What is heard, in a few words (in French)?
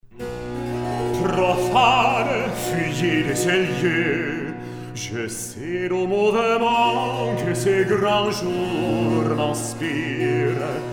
Recitatif; Bacchus